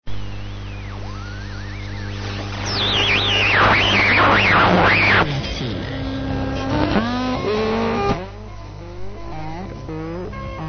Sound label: tuning